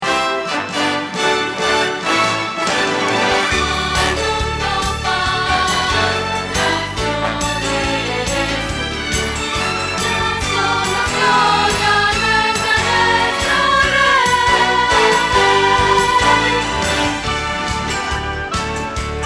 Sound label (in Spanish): Contralto